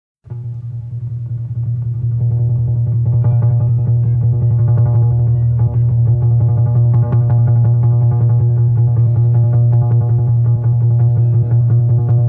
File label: rhythm